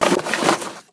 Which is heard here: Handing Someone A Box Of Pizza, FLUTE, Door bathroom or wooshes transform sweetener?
Handing Someone A Box Of Pizza